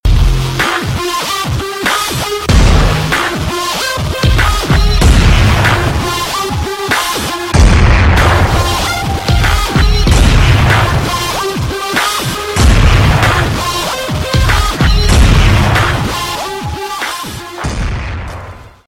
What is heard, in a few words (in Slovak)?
prestrelka; jailbreak